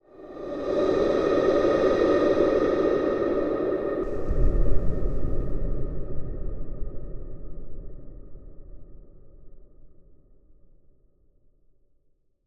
horror breath thud

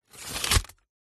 ripping a piece of paper; Sound Ex Machina

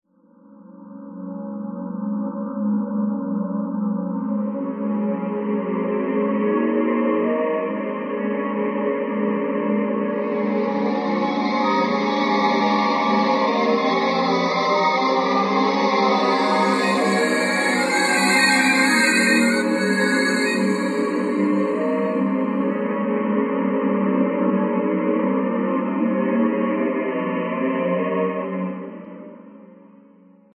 sound design crazy orchestra 120bpm; Audio Mangler

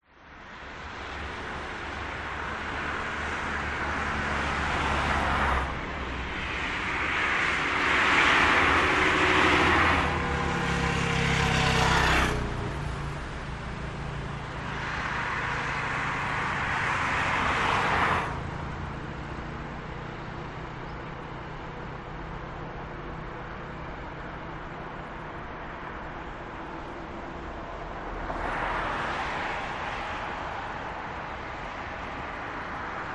traffic Germany autobahn a8 med busy

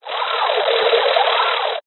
science fiction transmit error burst; zapsplat

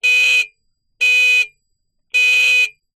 motorcycle horn honk

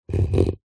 foley wood scrape sharp knife score against grain

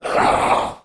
Demon; little robot sound factory

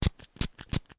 household perfume spray x3

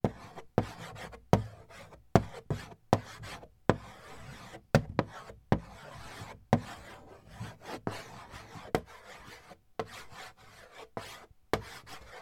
office chalk stick write on chalk board; sound effects one

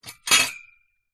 household rake metal set down on concrete

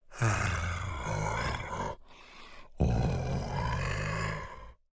MONSTER Yeti purr

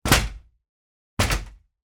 Back Door Slam; noise creations

